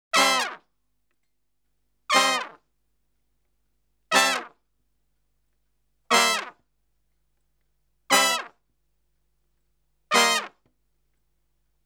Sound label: Salsa Picante; Masterbits; World Zone VOL-3